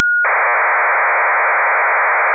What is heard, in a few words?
HFDL 300